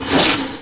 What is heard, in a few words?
cleaner attack; jorgan